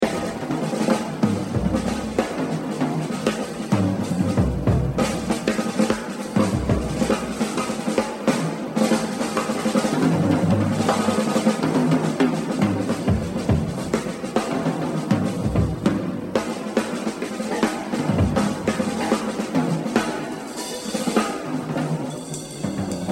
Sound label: Etude 7B, jazz drumming; Buddy Rich; The Evolution of Jazz Drumming (Gottlieb)